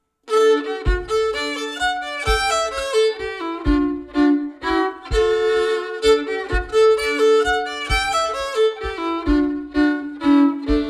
Valse

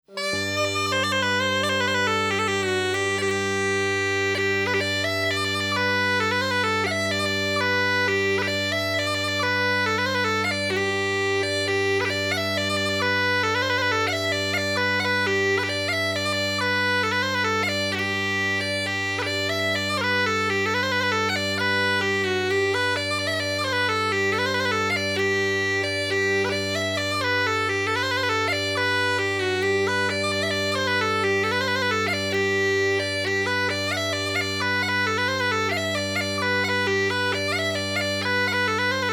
Sautiere; Sautiere du pere Piaud

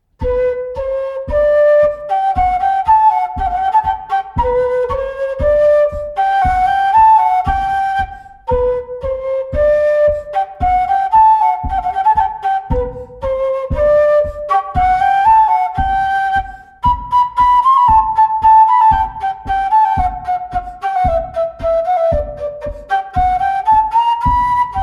Bourree; Recueil Laussedat

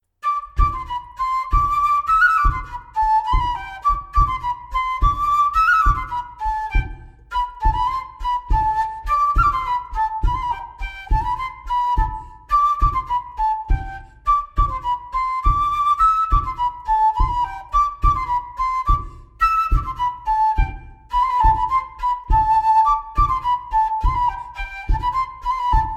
bourree; Coatrieux

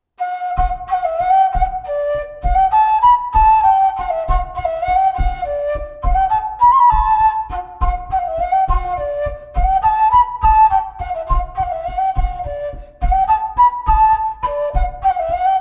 Montagnarde; Recueil De Raoulx

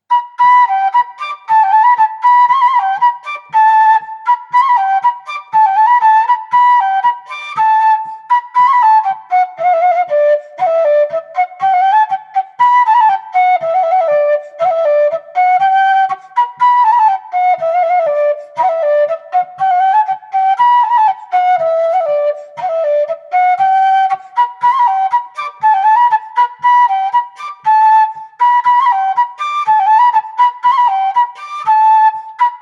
Bourree

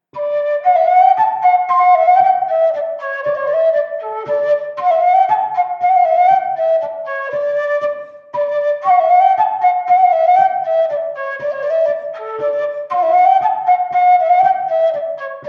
Bouree lyonnaise; Ms Dauternaux

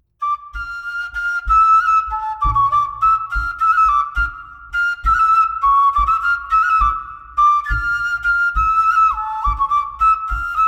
Montagnarde; Claussmann

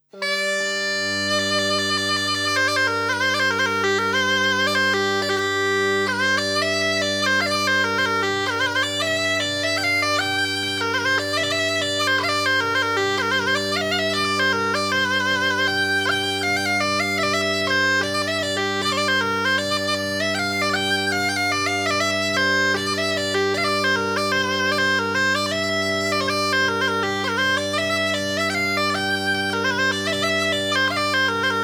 Mazurka; Mazurka de Pechadre

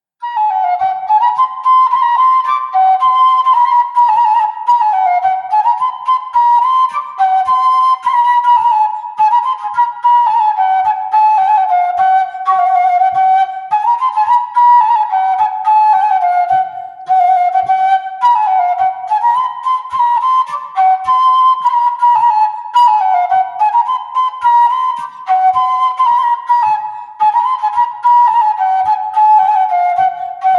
Dance d Auvergne; Chédeville; Les Dances amuzantes 1733

